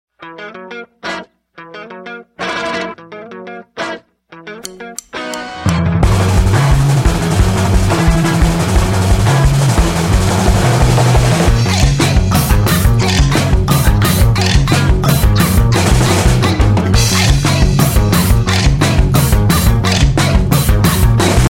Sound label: cousins instrumental